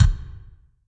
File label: Kick